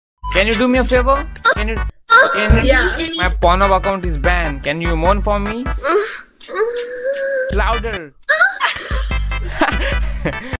GIRLS MOANING; omegle

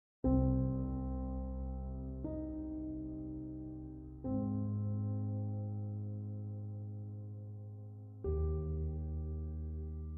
instrumental music

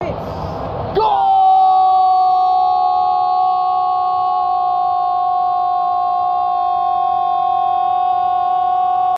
screaming GOAL; speed; grubhub meme sound effect

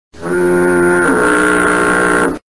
stink noise; made with Voicemod

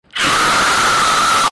scream

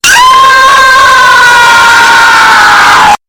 Scream; meme

